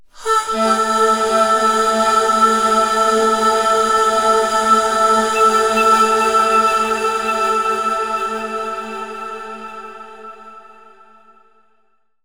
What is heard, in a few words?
Granular Vocals Am 60Bpm; Construction Kits; STEMS; Ghosthack; SkyRealm; Kit 28 - Long Walk Home